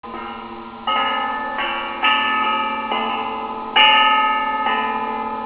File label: Bell Buoy